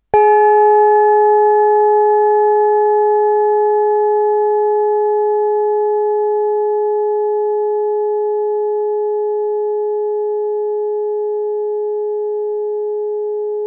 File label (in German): GONG OBLONG; Synodischer Mond